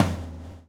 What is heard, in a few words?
swing